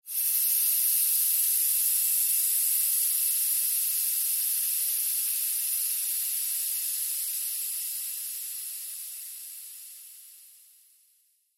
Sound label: Sci Fi Air Release Expel Hose Pneumatic; Spacecraft; Blastwave